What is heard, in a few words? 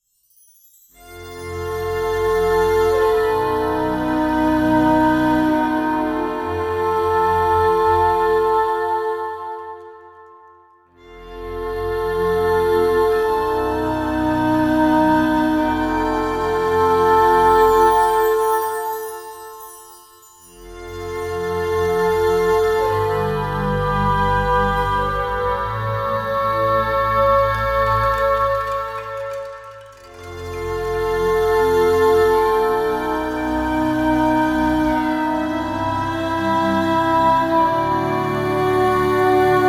Foreboding Angels Ambient